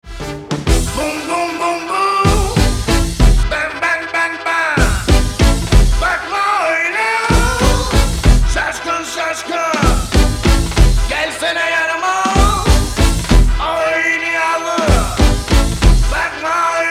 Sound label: Old Bazaar Groove